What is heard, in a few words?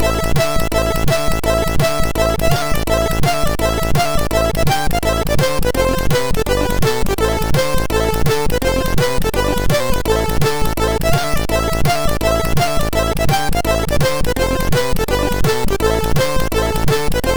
MOS6581R3 sid